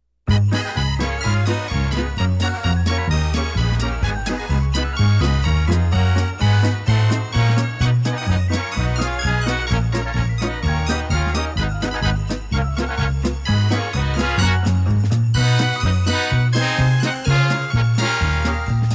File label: Harpsi Polka